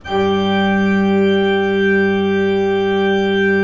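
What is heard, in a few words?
ORG G3, KEYS; Synclavier Sampler Library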